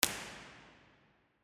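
mono, impulse response, Lady Chapel St Albans cathedral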